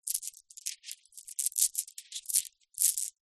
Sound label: stones precious gems in pouch handling; foley; zapsplat